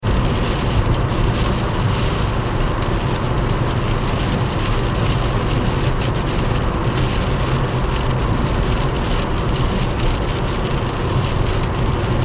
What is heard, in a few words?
transportation car driving 70mph internal rain ford focus; Car Driving 70 Mph In Rain - Internal Recording - Ford Focus; Cars; Vehicles